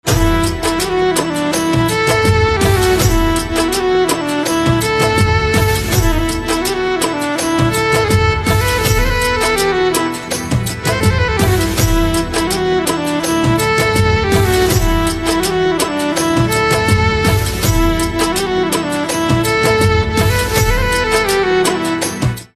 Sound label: Harmonium Instrumental Ringtone